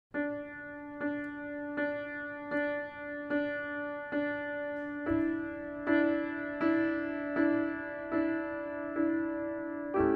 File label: Adagio from Oboe Concerto in D Minor